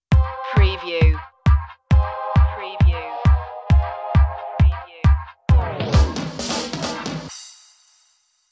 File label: on the move stinger